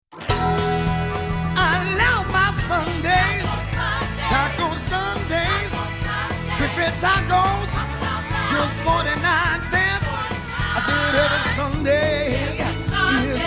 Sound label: Jingle; TacoBell